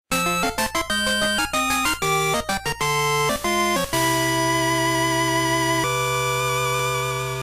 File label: Game Over Pinball; Música del final del juego de la banda sonora de Pokémon Pinball.